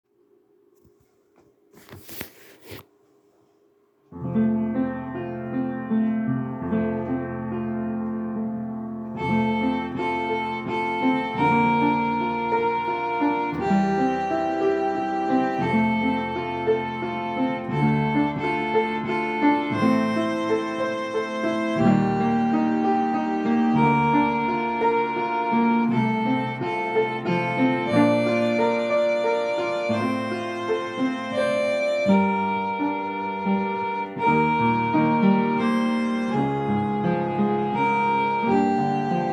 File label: viola